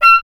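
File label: oboe, wind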